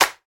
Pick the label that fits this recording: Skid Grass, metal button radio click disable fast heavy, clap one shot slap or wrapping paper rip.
clap one shot slap